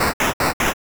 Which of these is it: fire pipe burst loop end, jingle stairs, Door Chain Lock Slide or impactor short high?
jingle stairs